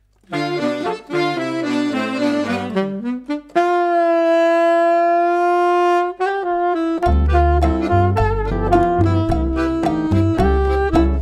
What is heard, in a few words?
Cha Cha Cha